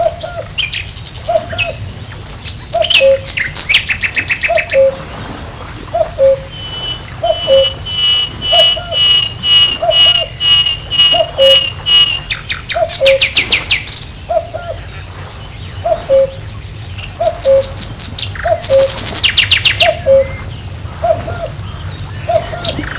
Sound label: birds